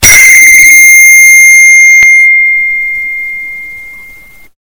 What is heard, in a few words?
iphone